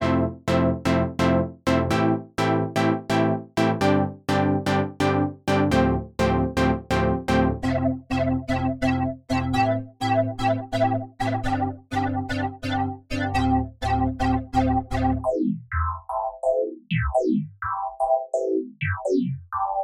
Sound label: Poly Synth; Extremum